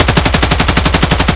rpk16 outdoor distant loop; Escape from Tarkov

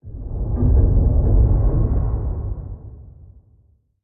submarines engines

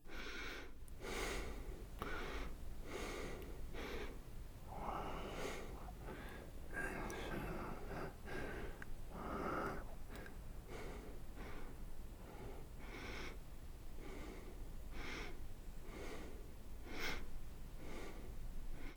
breath ok; Escape from Tarkov

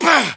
melee attack

